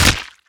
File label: Fruit Breaking; Angry Birds Rio